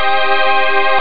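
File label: melo minor; jazz jackrabbit 1; M8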